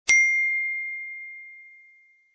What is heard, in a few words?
iphone whatsapp tone